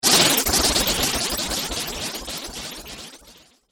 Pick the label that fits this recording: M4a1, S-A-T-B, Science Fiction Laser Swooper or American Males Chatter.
Science Fiction Laser Swooper